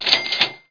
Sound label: CASH